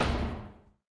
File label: button click big; WoWLoginScreens; ui